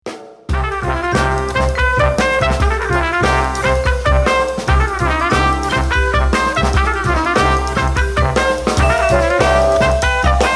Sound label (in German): zwiefach; boxhamerisch